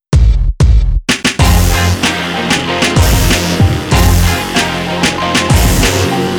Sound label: Shake it Baby